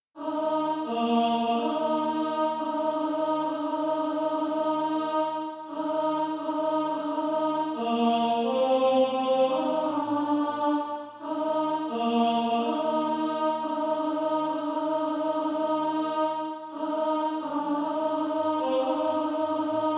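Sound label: alto, Practise Music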